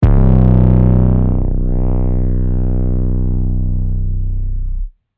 Heavy Distorted 808; drums; single hits; Khezie 808s

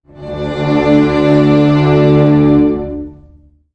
big orchestra note A; Orchestral

